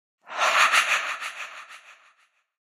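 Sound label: Breath Demon Exhale, scary voices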